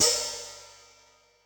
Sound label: H, Hi-Hat, Yamaha DTXpress